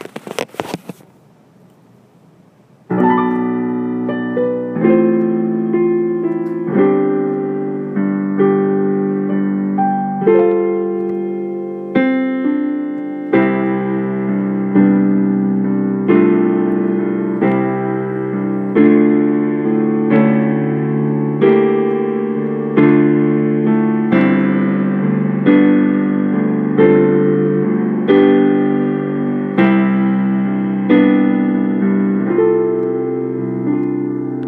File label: piano only